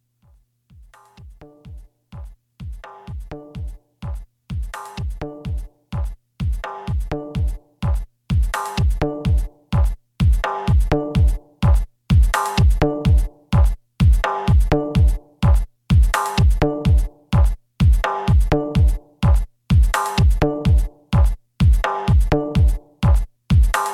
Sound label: FX Flanger; TRAKTOR PRO 2; traktor scratch a10